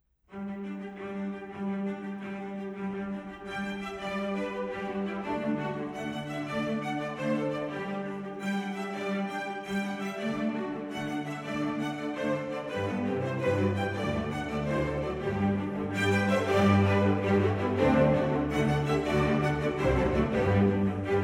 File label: Strings; Werner Pirchner; Sondeckis